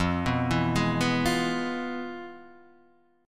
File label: F Minor Major 7th Flat 5th Guitar Open D